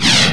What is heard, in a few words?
pkup, items